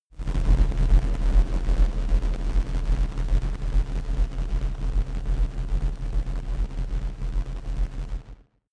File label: dark rumble noise, sciene fiction